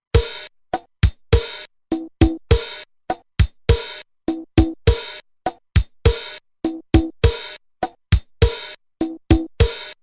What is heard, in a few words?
Mambo, Roland TR-505, Factory Rhythms